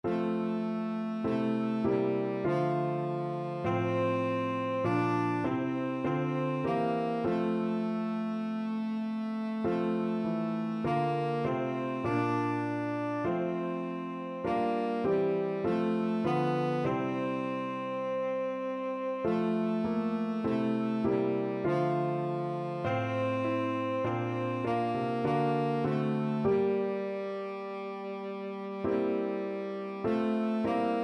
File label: tenor saxophone